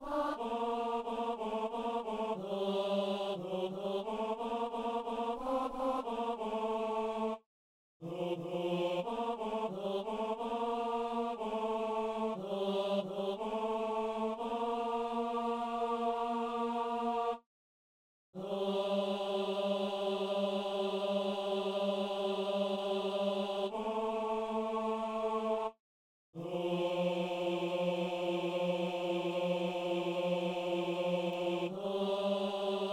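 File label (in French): ténor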